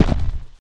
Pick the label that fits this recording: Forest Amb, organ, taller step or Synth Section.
taller step